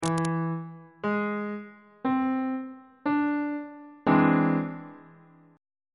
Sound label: d double sharp augmented 7th chord on bass clef